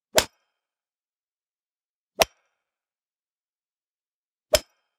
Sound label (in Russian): 3 varianta udara kliush golf